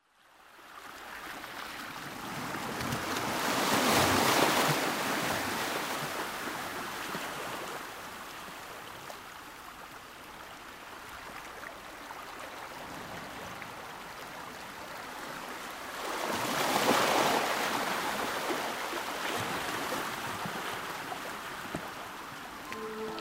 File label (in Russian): Shepot voln zvuki prirody